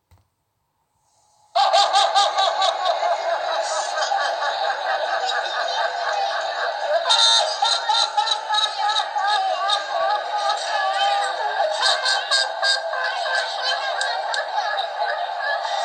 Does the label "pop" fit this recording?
No